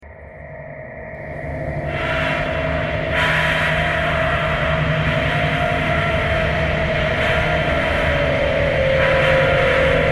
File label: thread buzz